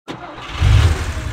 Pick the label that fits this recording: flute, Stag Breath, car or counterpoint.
car